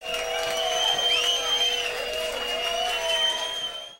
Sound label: sfx up; arena